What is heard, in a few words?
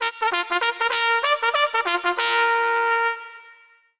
trumpet